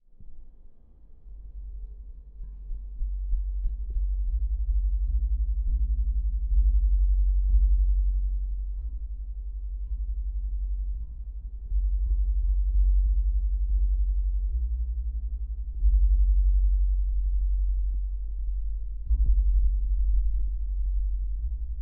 Poll Vibration Rumble

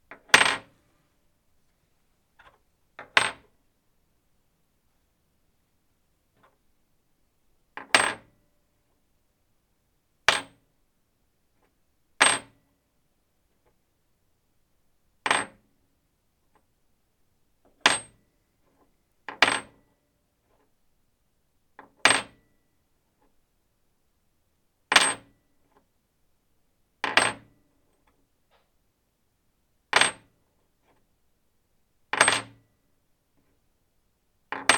Bolt Drop On Wood Table

